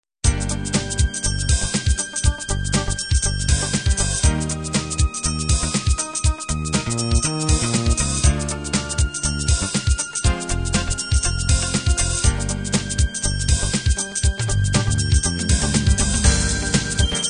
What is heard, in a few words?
trendy beat